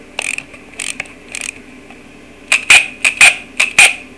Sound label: robot star